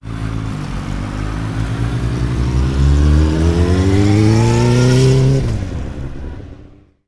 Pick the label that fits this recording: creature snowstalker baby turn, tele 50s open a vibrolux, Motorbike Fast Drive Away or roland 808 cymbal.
Motorbike Fast Drive Away